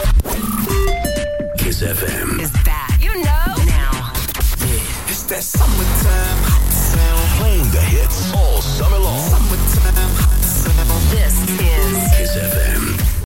fm jingle; Kiss fm

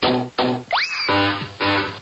Clown Horn

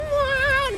Whale

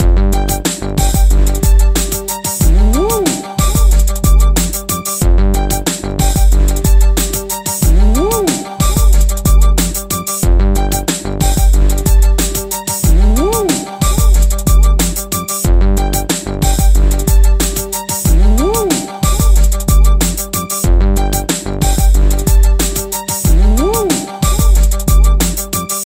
Rap Rhythm

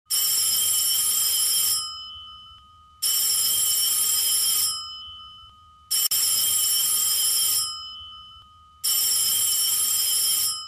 Ring Tone

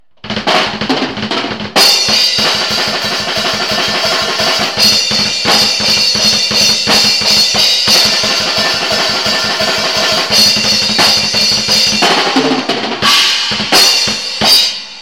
Drum Solo

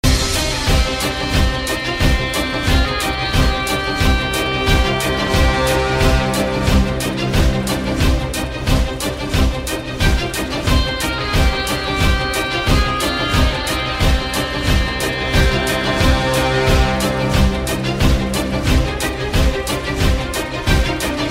Aggressive War Epic

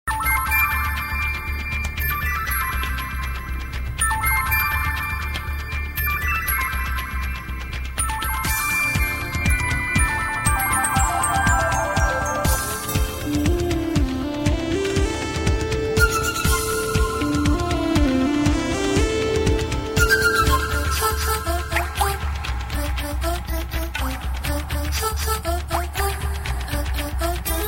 Melody

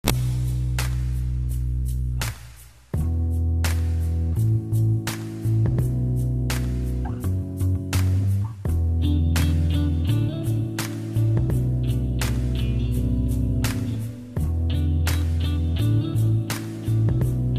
Neo-soul Beat

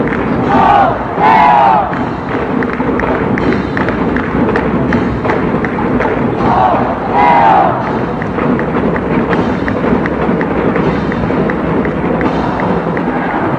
Cheer

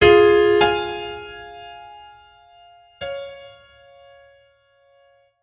Clair de lune

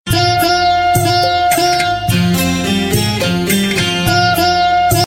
Folk

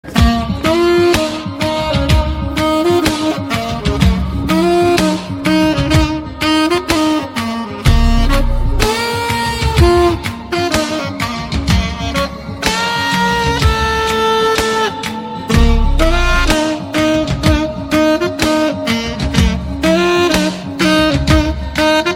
Saxophone

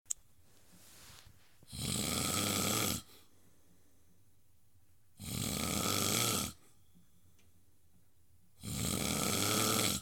Loud Snoring

